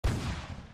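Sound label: Shot Quiet, tanks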